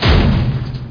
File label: T-CAN; Millennium Gold 2000; Monster